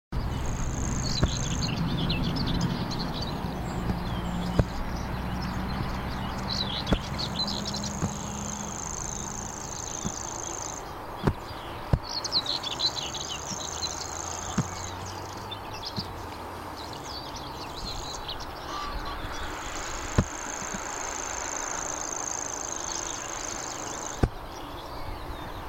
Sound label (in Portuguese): canto da felosa malhada